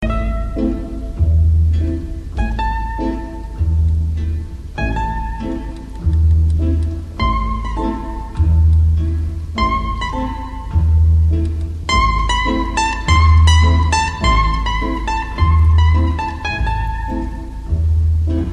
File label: russian fantasy